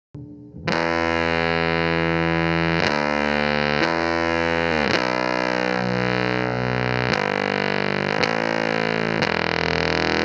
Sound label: bass mode, fuzz